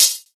clean pedal hi hat f key, Royality free hi-hat tuned to the F note